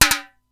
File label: Simmons SDS-9